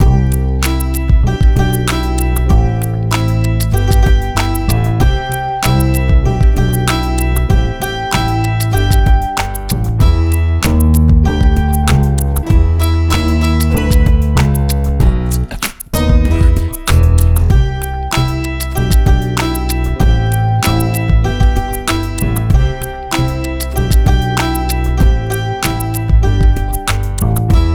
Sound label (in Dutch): lied touchetouche instrumentale versie